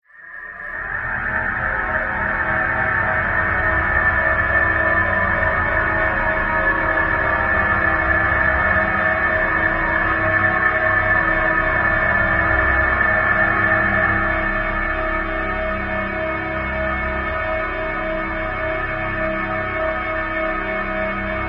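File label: Metallic Airy Shrill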